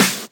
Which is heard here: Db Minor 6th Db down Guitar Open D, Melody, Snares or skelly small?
Snares